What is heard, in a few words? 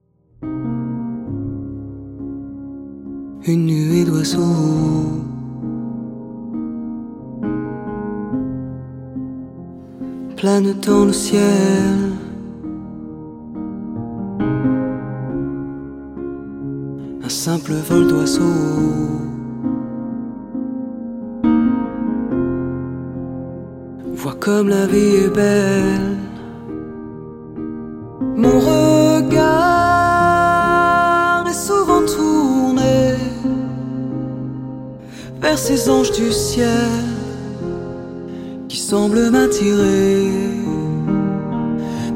PIANO VX